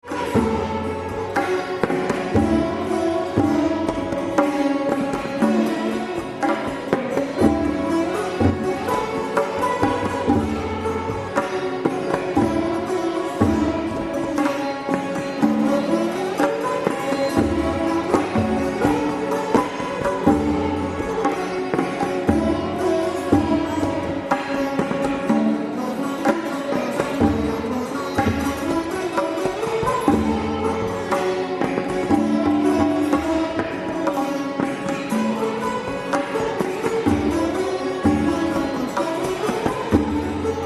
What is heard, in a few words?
Mohan Veena